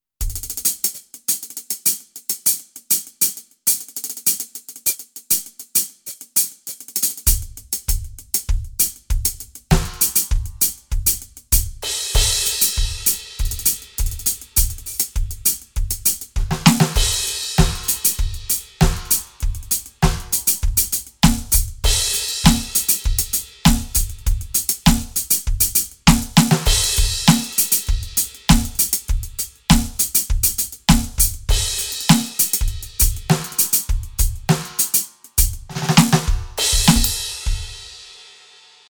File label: ROLAND TD 17 KVX HH im Set